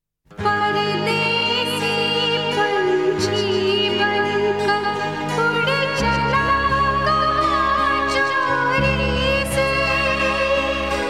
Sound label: (Instrumental)